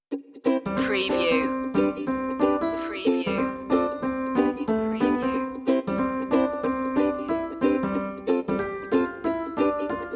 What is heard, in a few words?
reggae happy piano